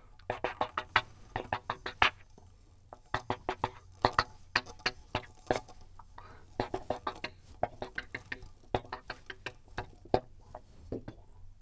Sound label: bird